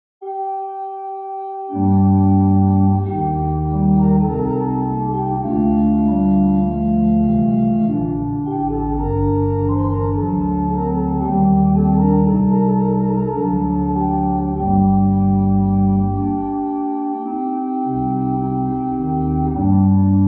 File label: chorale prelude, organ; Nun komm der Heiden Heiland